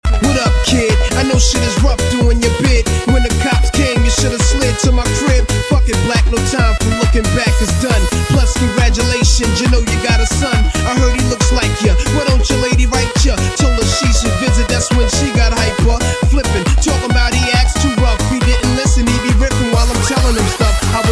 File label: rap techno; hip hop